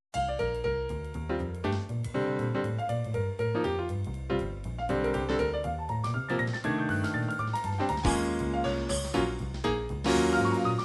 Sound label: jazz, midi